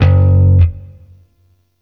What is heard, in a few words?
Sentient Shawzin Oct One F Sharp; Warframe